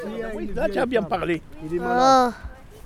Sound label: ouah; tu as bien parle